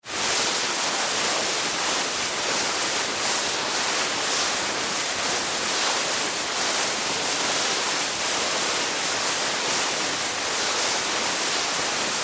Snow Ski Down Hill, Snow Ski Down Hill Slope Glide Fast; Skiing And Snowboarding; SoundEffects-Blastwave; Sports